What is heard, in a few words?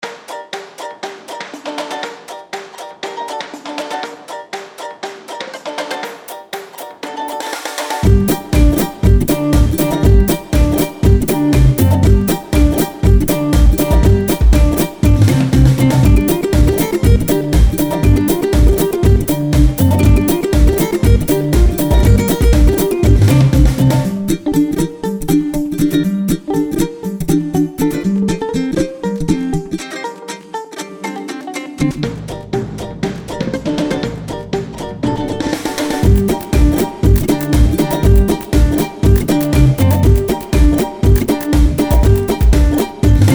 uku